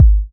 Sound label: BD